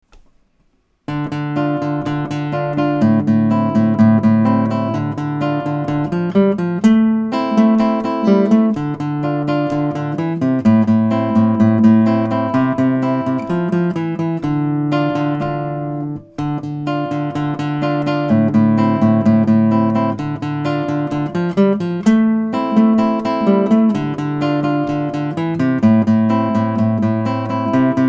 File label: Acoustic Rock